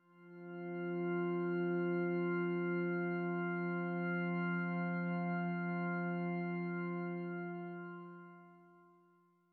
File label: Church Organ; Grindstone